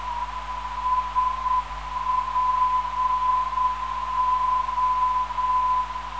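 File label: SPO 313kHz, non-directional beacon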